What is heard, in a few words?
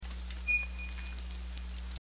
tonal squeak